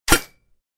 Bullet hit on metal object

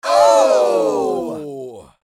Crowd shocked reaction surprise

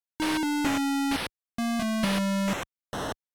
Retro 16 bit or 8 bit game over tune

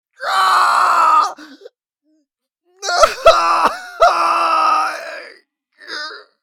Male yelling in pain screaming loudly

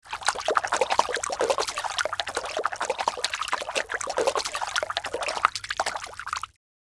Fishing fish water movement fast splash

